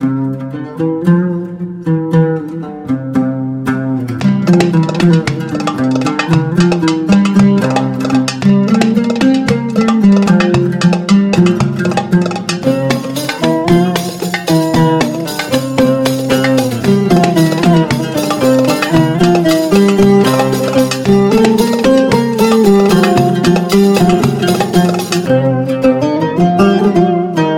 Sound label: oriental dance